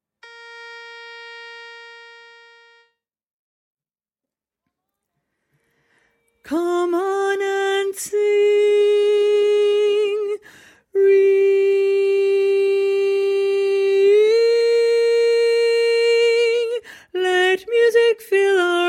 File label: baritone track, chorus